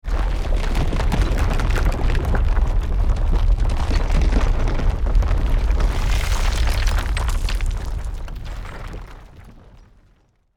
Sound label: Volcano rumble deep powerful; nature